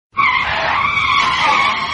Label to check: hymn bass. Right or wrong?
Wrong